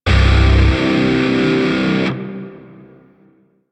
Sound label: Shawzin Blues Chord D; Grineer; DE; Warframe